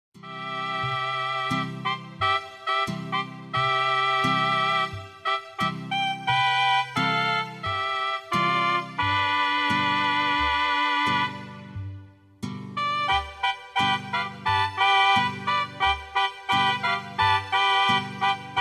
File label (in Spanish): Mariachi